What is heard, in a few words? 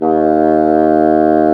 BASSOO, Bassoon; Brass&Woods